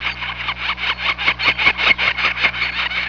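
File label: Northern flicker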